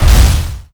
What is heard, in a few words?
Wall Hit; Nicktoons Nitro; arcade